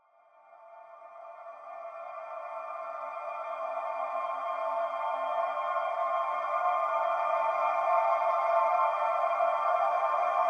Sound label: Granular Texture Ambient Atmosphere; Glitchedtones; Granular Textures